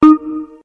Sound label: Countdown